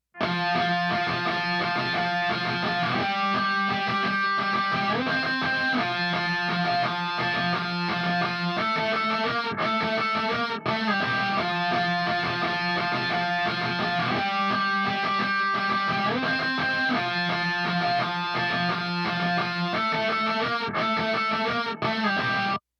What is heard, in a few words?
guitar on; superTap